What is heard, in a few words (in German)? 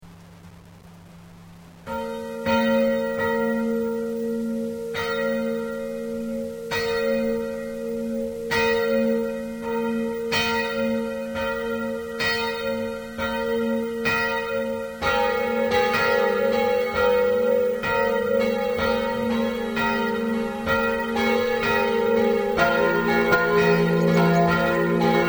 glocken